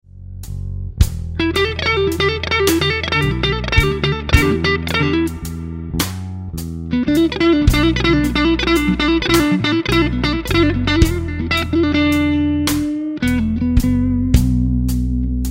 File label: Lick, guitar; Slow Minor Lick 5; Minor Blues licks